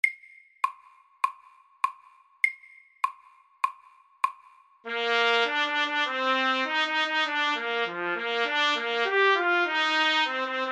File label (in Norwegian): Trippeltunge etyde SAKTE